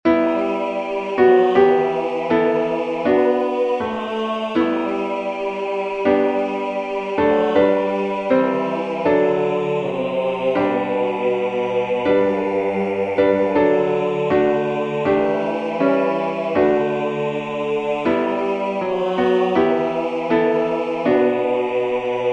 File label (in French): Basses Voix; bass baryton